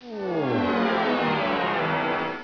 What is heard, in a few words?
wof bankrupt